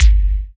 BDRUM; E-Lab; X Static Goldmine 2; akai

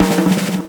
DRMROLL; E-Lab; X Static Goldmine 2; ROLLS

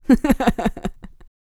LAUGHS, Vocal Planet Jazz Fx

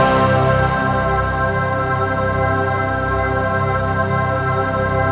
ATMOPAD; Best Service; XX Large; Pads

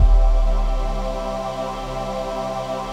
VOICE PAD, ATMO PADS; Best Service; XX Large

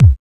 HSE-BD

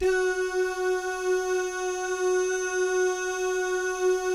"doo" guys, Vocal Jazz Fx

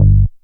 BAS TON, SOLID BAS; E-Lab; X Static Goldmine 2; akai

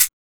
SHK

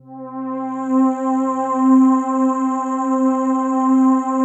PAD, ATMO PADS; Best Service; XX Large

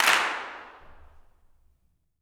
CLAPS, Gospel

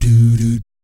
"DOO DOOT", vocal jazz fx

